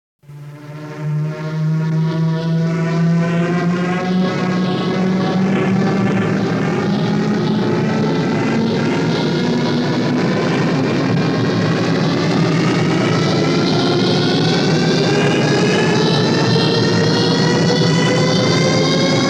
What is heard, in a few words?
Warp Drive Accelaration & Deaccelaration, Sound Effects; Star Trek Original T. V. Series Sound Effects